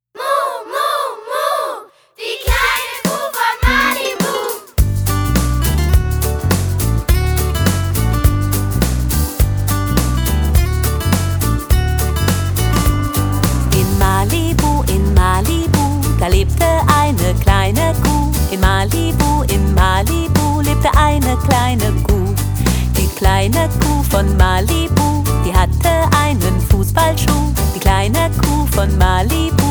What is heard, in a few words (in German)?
Muh Muh Muh